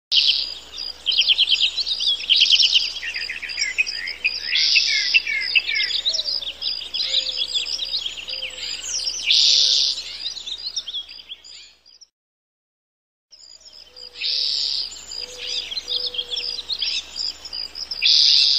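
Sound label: environment morning; Joan of Arc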